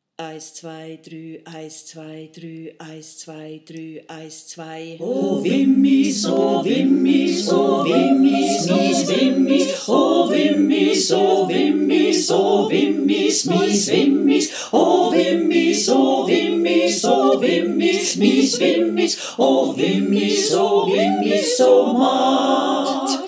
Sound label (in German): Wimmis Walzer mehrstimmig